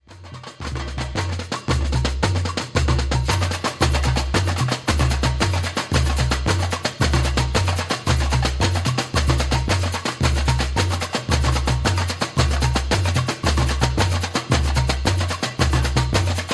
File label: batucada